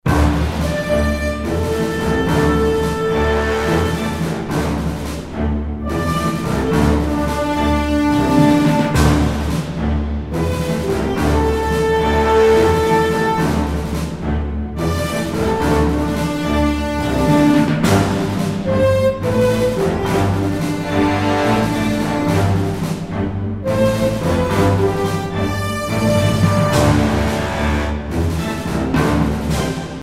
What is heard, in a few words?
Confident; bridgecommander